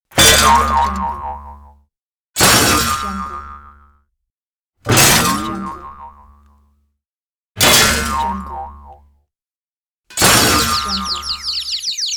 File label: cartoon crash